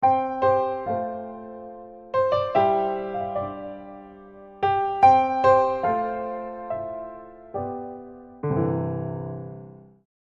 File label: Introspective Piano Etude